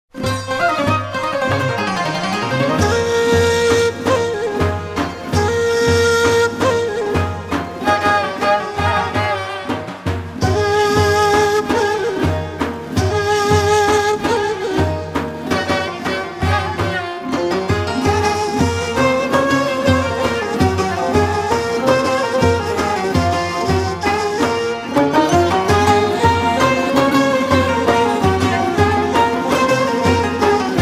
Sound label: Ring